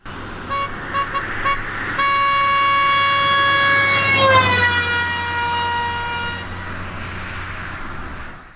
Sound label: doppler